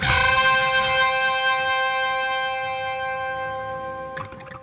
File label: Funk Guitar; 90 s Sample CDs; Zero G